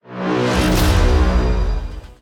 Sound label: small win